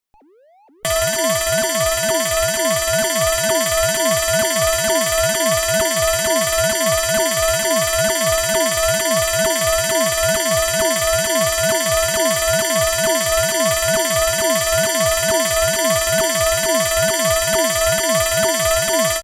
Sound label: Alien Alarm